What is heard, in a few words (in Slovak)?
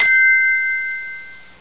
Zvoncek; Revelation Sight and Sound SK